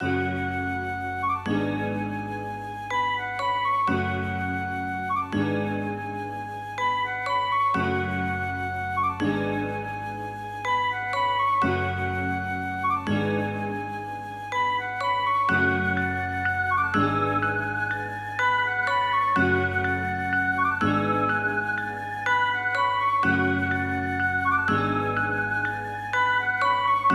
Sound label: 124BPM, F# Minor